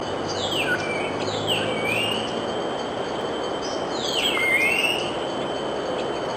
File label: Hill Myna Call whistles; Amar; Tiong